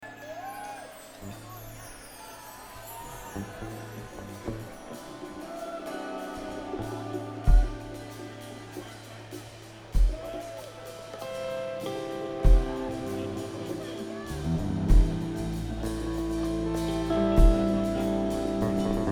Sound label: Drums Jam